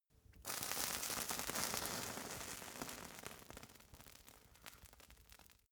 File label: fire torch sizzle crackling